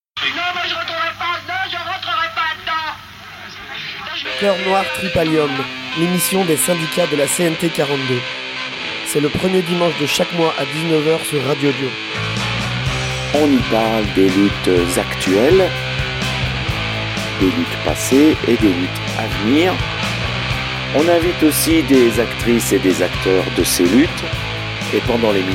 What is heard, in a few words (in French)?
jingle